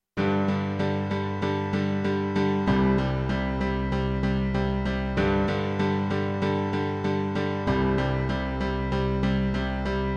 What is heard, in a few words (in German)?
Gesang; Frauen-Maenner-Abgruende